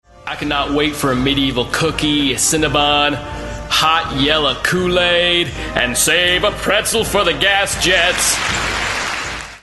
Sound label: gas jets ringtone